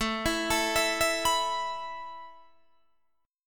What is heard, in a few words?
A Suspended 2nd A Guitar Open D